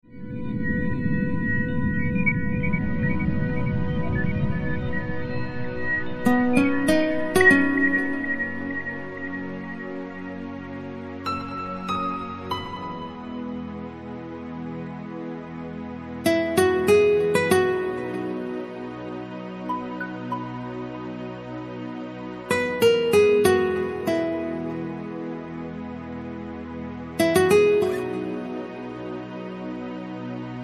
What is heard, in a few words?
sounds of guitar